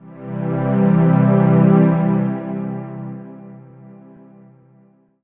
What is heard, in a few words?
Pad Chord; Big Fish Audio; OSH Chords